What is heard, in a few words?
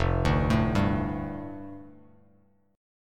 F 6th Add 9th F down Bass Standard, chord; F6add9 arpeggiated